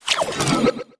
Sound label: ui academy fail-research menu